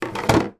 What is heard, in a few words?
game barrel base put in box; pop up pirate